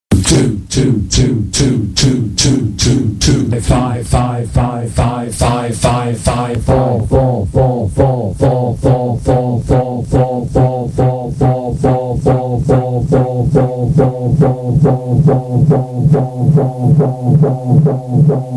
Jingles

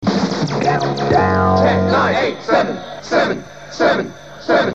Countdown Jingles

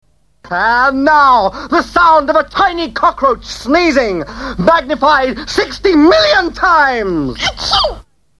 Jingles

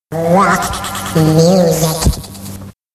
Jingles - Rock Music; MAR Jingles; Original MAR Jingles

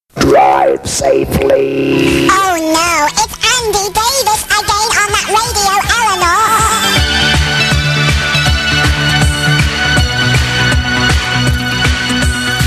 Jingles

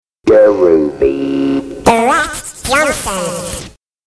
Jingles

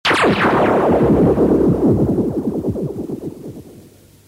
Stinger

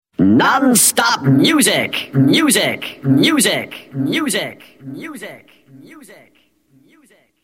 Non stop music; JINGLES

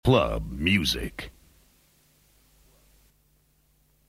Club Music slow, Jingles

